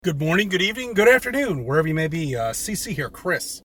stereo distort intro

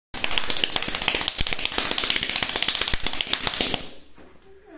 double tap

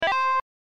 ringledoodledoo

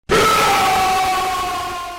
jumpscare sound; night shift at pizzeria 2

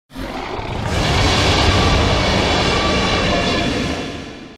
giga roar

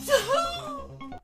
manly squeal

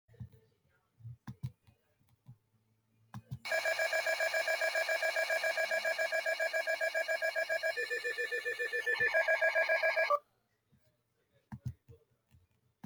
angry

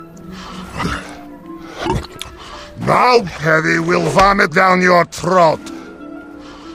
vomit

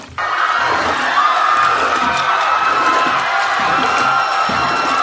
people drowning and panicking

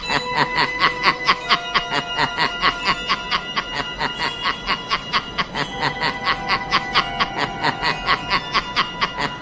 last laugh; joker

